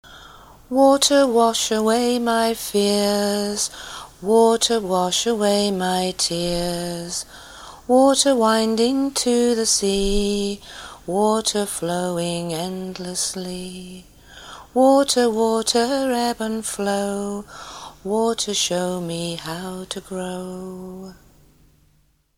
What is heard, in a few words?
water; BRIAN FIELD (fieldofsky)